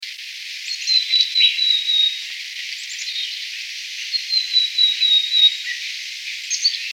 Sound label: song; BCCH